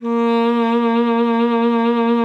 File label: A#3, alto sax; Xsample VOL-2